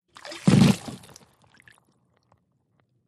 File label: Splash Spill Thud, splashes